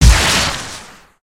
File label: baby spider hit; creatures mechanical